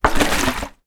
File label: industrial methylated spirit mostly empty plastic bottle shake; zapsplat